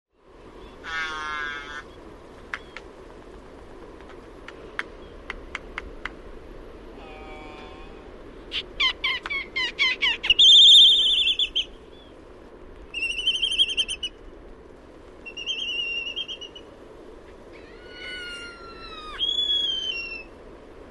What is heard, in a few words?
laysan albatross